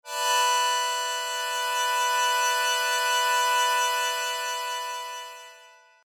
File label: Sho